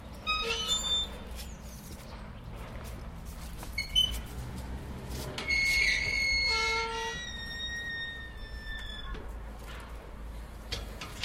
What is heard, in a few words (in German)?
Eingangstor; Eingangstor Klosterbergschule; Geräusche zum Hörspaziergang und erstem Schüler-Podcast; Klangexperimenten